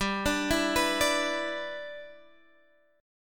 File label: G 6th, Guitar, Standard; G6 arpeggiated